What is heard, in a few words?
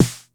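909 snare drum, TR-909; Crypton - DrumBase5000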